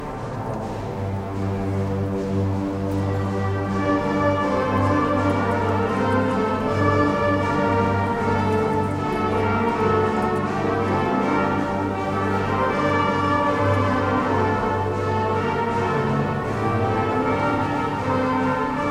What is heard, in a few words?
Patapan excerpt Orchesta